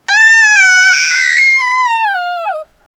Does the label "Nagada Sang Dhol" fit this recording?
No